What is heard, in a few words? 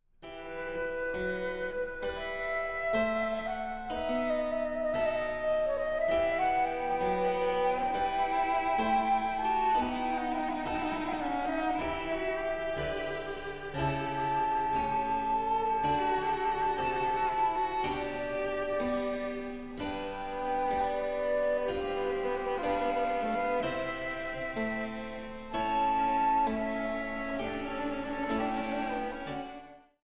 Sonata for Flute